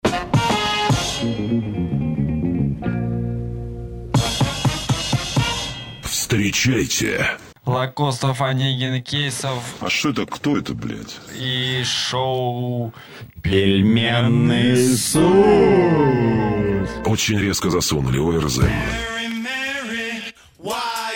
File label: New Jingle